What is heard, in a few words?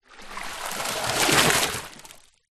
nature water splash movement pour into deep water; zapsplat